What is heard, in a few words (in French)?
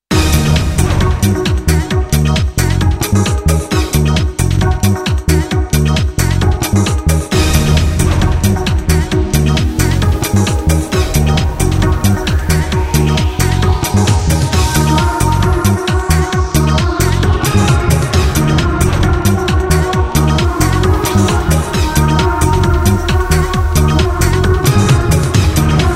generic shopping charme